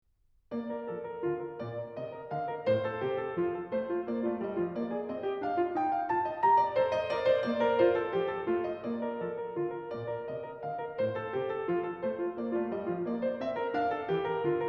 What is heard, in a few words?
Partita no. 1 in B flat major, BWV 825 - Menuet I